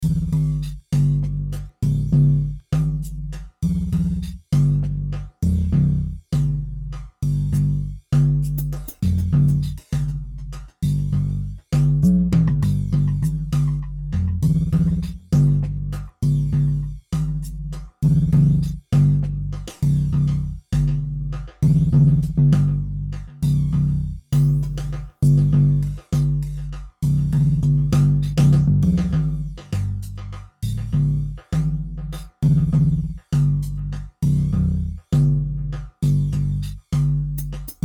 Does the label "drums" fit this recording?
Yes